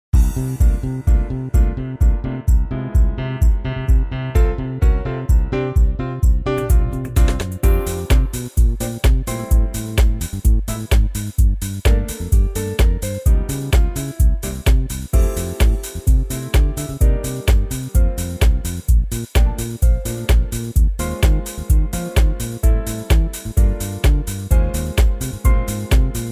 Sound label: smooth